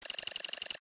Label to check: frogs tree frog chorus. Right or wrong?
Wrong